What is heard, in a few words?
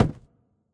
phys cube, physics